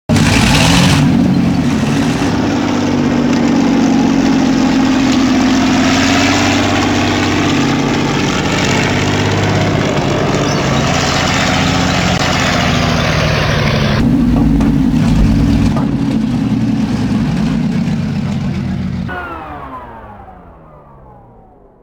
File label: panther; mow